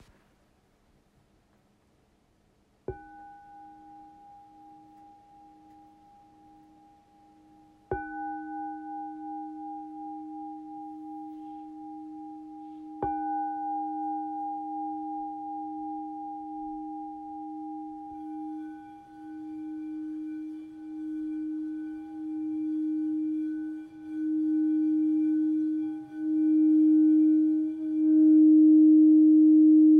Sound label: BOWL; zetsu